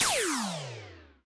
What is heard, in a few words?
laser; AssaultCube; Alien Stars